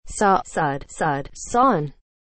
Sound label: saw